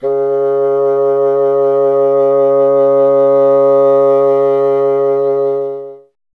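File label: wind bassoon C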